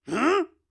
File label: floor fall; Zote; Hollow Knight